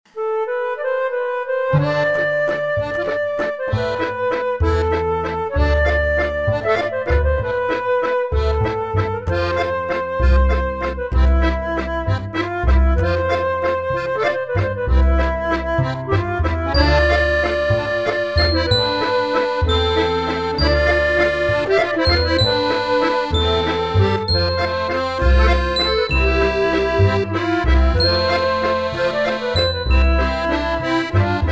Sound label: Am play flute reference